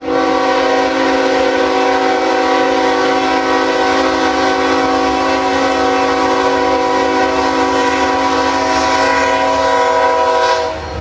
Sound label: horn